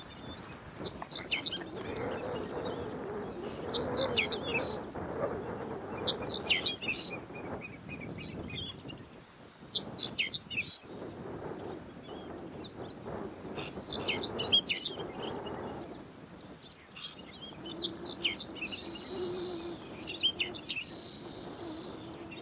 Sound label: correndera pipit